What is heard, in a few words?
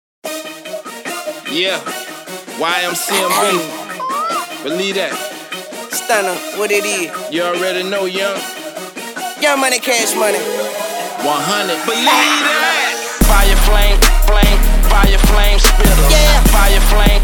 Fire Flame Low Bass; Oleg